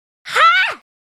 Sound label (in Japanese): 「はぁ！」; スキル（スパイラルボルテックス）